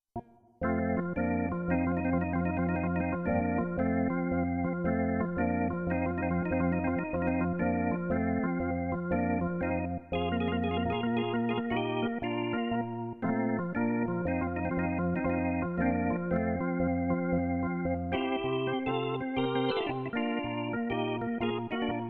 hammond b3 organ chords

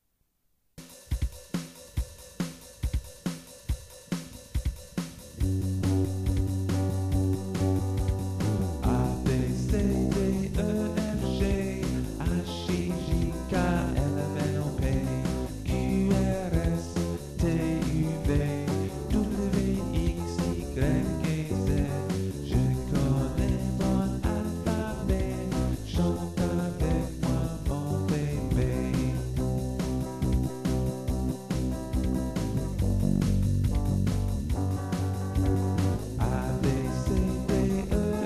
alphabet song, French

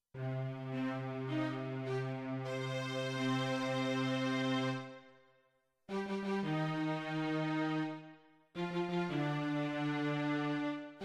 5th symphony strings; beethoven

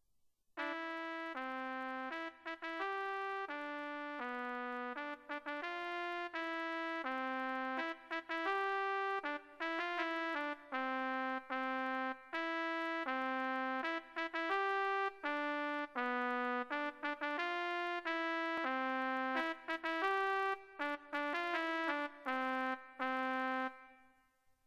trumpet melody

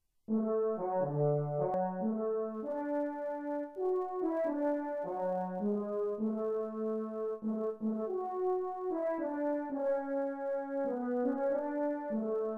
french horn melody

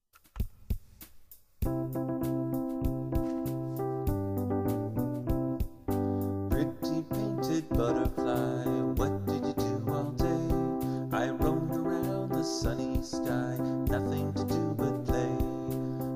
pretty painted butterfly song

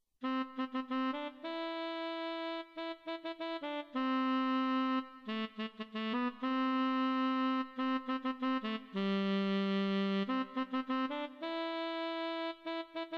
saxophone melody

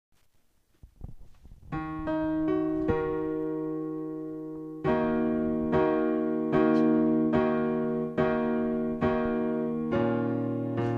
minor key rain songs

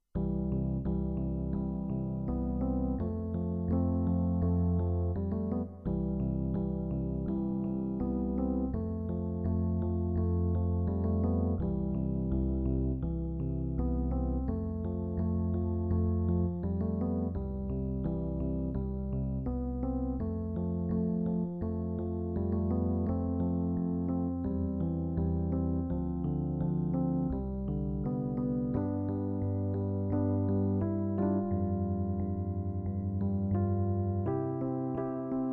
electric piano chords

